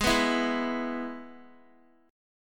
Ab Minor Sharp 5th Ab down Guitar; Abm#5; strummed

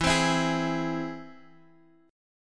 E Augmented E down Guitar Standard; strummed

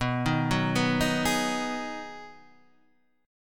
B 6th B, guitar chord

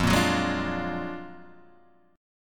Gb Augmented Major 9th, Guitar

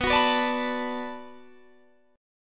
B Minor 7th B, chord; Bm7 strummed

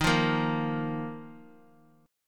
Eb Suspended 2nd; Ebsus2 strummed; JGuitar

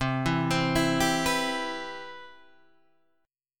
C Major 7th, Guitar Standard; CM7 arpeggiated; JGuitar

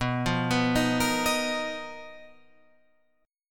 B Major 7th Flat 5th B down Guitar Drop D

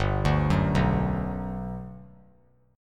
G Major 7th, G, down; arpeggiated; JGuitar

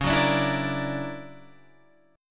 D Augmented Major 9th C sharp, guitar

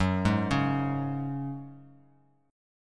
Gb Suspended 2nd; Gbsus2 arpeggiated; x,9,6,6

